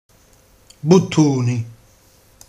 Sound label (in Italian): bottone